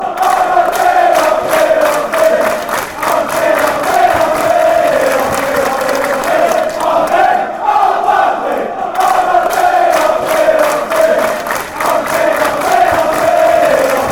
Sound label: aberdeen aberdeen aberdeen, fanchants